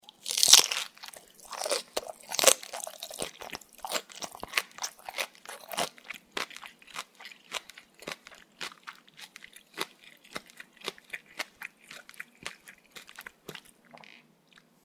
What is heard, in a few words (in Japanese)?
ピーマンとひき肉の咀嚼音 口閉じてる